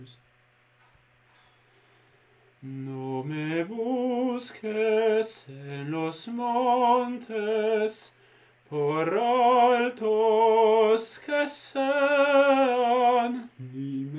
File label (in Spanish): esta tierra, soprano